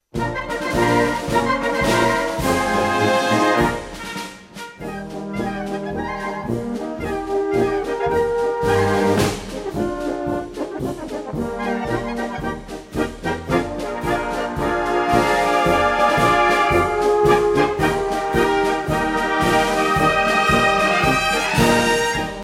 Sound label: polka